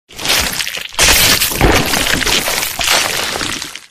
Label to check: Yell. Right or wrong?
Wrong